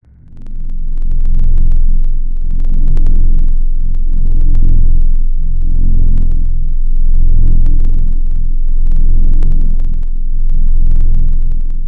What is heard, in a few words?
Pan Flute Vibrato; Synthetic's Serum Collection; Echo Sound Works Sphere